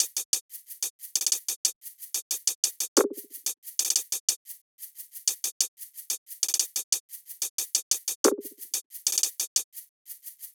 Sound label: hat loop 91, Drums; Pop; Hat Loops; belday; Slate; SD PASADENA Pop Sample Pack